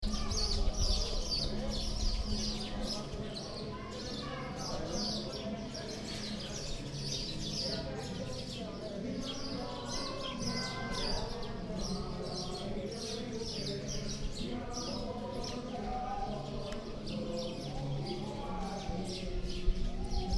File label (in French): concert oiseaux lanzarote